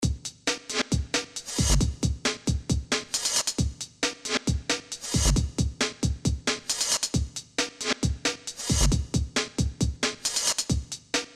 loop beat

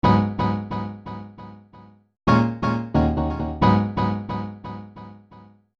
piano

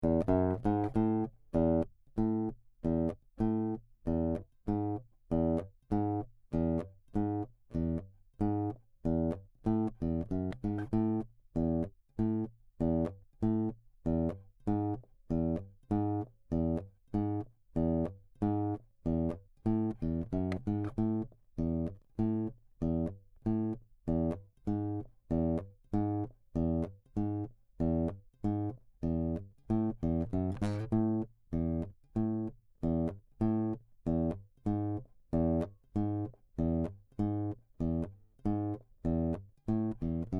git pickup

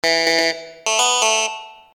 clavinet

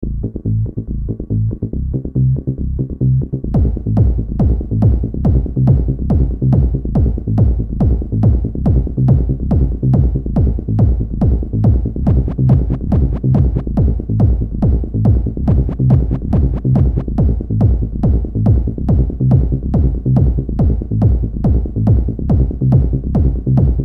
dark trance

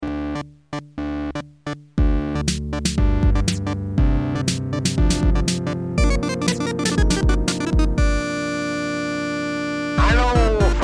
trailer theme

